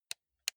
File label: console controller playstation press slow soft